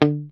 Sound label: STRAT, Classic Guitars; E-MU Formula 4000 Series Vol. 3 – Analog Odyssey